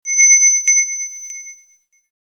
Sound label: Trembling Sms